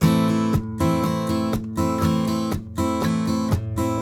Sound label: RIFF, Blues Guitar; ILIO; Fretworks